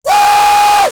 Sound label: mythical eagle scream; mythical creatures